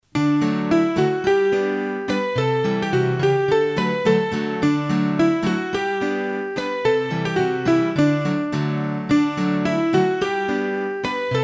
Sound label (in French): La Bourree Carree de St Chartier, hurdy gurdy; trad; Bourrees a 2 Temp